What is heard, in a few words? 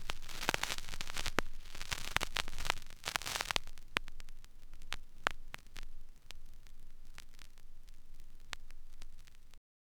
needle coming off a vinyl